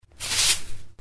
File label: 1 time sweeping with straw